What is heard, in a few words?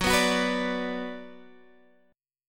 F sharp Suspended 4th Sharp 5th, Guitar, Open D; down